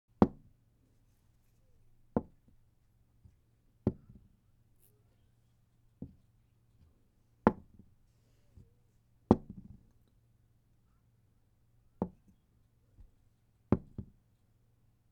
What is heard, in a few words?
Chest Pieces